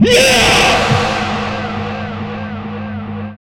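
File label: vocals, Jungle; E-Lab; XTC Files of Jungle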